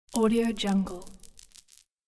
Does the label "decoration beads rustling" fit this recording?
Yes